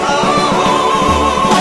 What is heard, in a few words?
ahhhh